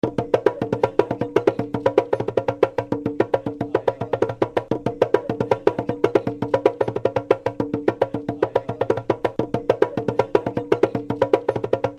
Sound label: kidi